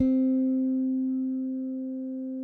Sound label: SPEC SUSTAIN, Bass; Sampleheads; Will Lee Bass Library